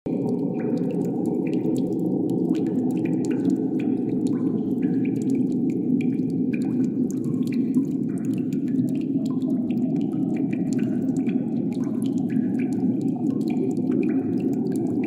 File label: reverberation stone space; عمق ریورب خفیف